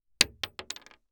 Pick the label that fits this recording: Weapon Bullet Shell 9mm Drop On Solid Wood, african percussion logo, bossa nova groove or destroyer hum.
Weapon Bullet Shell 9mm Drop On Solid Wood